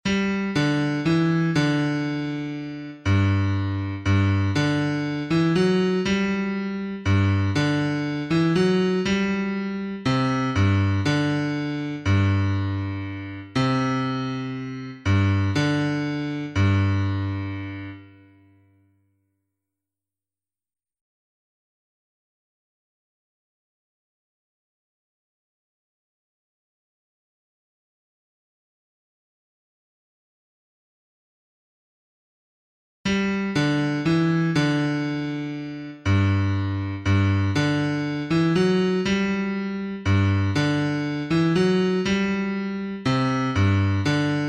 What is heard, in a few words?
regina coeli b; g aichinger